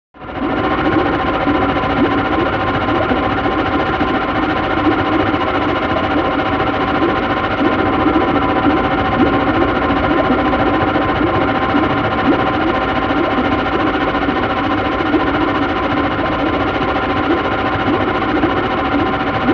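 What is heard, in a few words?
Electric Motor Bubbling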